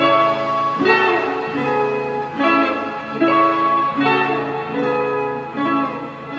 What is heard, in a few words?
guitar whisper thin, Loops